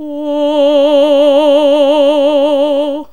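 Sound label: Classical Female D3, choir; Best Service Gigapack 1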